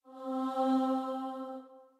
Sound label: starting note, voice; vivace in c major